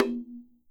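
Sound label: TASHA DRUM, ethnic percussion; WorldSounds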